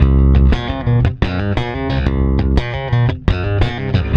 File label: PWR D, Bass, PWRSTRUM; Spectrasonics; Bass Legends; 128 PWRSTRUM